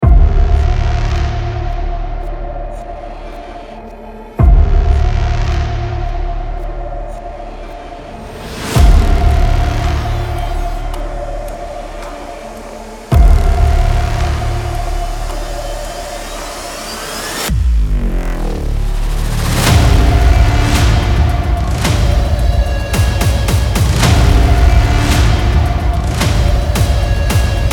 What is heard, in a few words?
dark mystery trailer